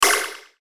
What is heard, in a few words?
button high tech; Multimedia